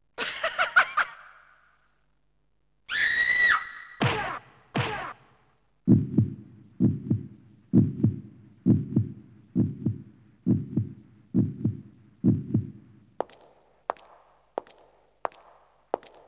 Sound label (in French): bruitage